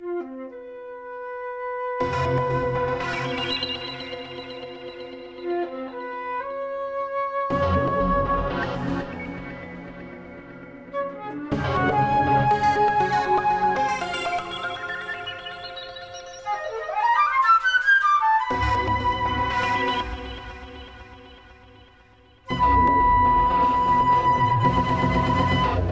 five movements for flute and electronics gracefully; in spite of everything